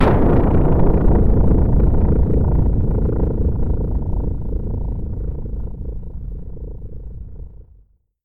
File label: Vibrating Jumpscare